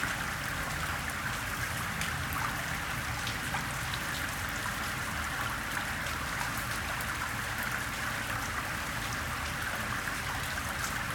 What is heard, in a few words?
Gentle rain, water runs off a pebble street, recorded through an air duct, background thunder at 1.46, loop; Sound Ex Machina; RAIN CITY